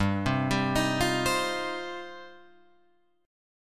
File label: G 7th Suspended 4th Sharp 5th, Guitar Open F; G7sus4#5 arpeggiated